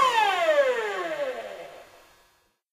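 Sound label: minigun wind down, weapons; tf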